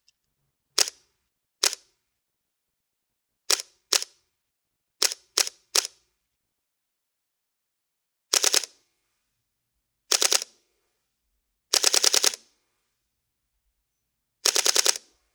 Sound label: Leica SL